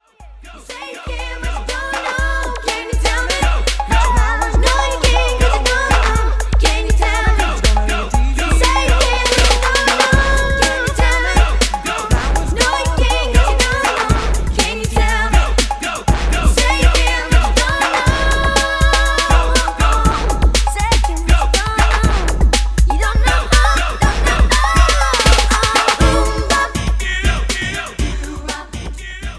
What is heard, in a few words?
bass mix club